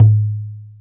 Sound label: Arabic percussion; Arabic Percussion Kit 1